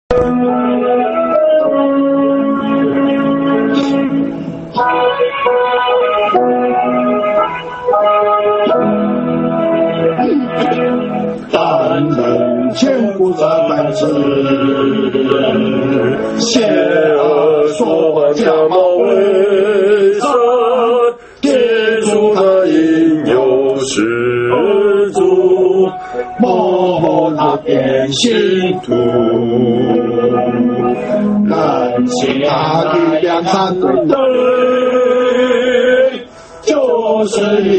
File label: hymn; worship